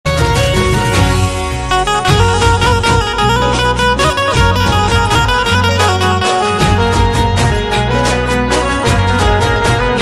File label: gujarati ringtone